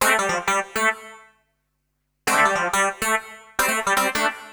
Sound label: Synt; FUNKACID5